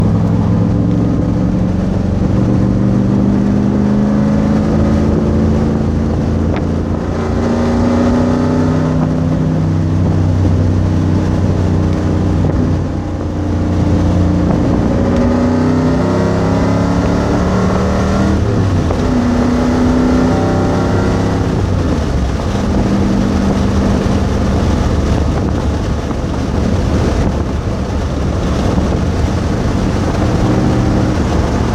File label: exhaust sample; computer diagnostics tuning